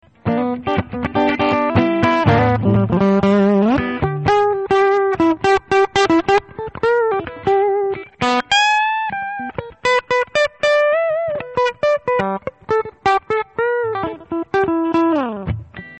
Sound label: johnson bridge clean, pickups